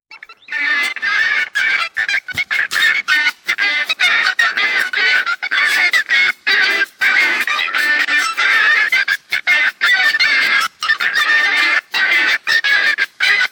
duck army mallard stati

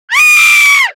scream style, scream; sound effect

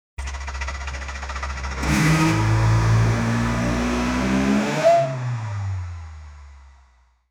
car transformation sound

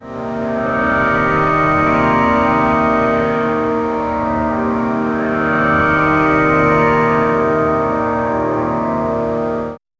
deep tanpura drone veena

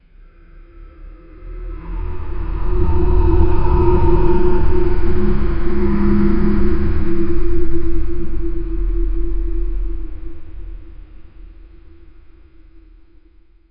a deep thunderous dragon

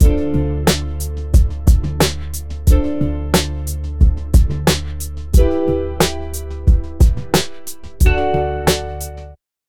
smooth chill hip hop beat

